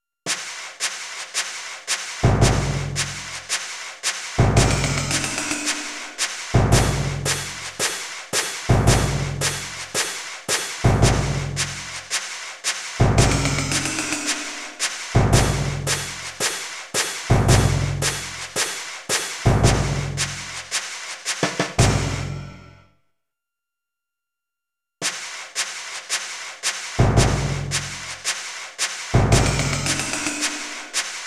Drums Play; Legend of Zelda The Majora's Mask 3D; 3DS

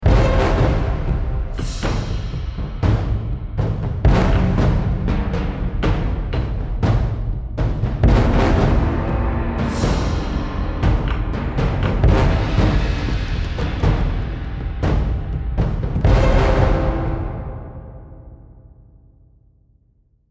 Battlemusic Medium; Age of Chivalry; Windows

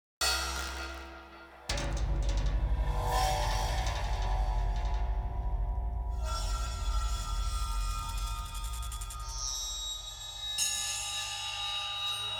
Combat Loop; DLC HarleyPolice

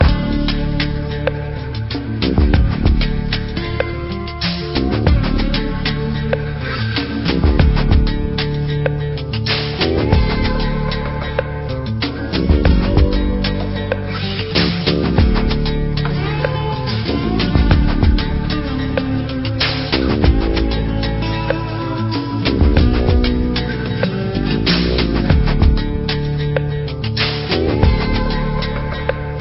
Ghost Town Background

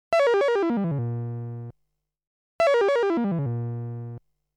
(Sound Effect) Commodity Tax, String Quartet

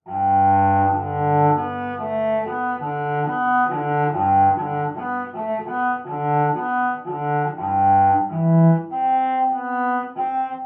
Contrabass; Assassin's Creed Syndicate

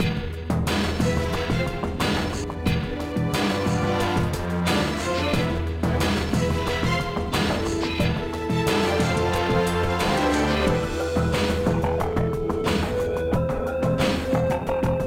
Nuclear Action Low

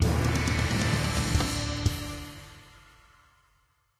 wave complete; DOJO; KOZU; The LEGO Ninjago Movie Video Game; PS4; Windows; Xbox One

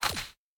Spawnin Land; boomerang fu

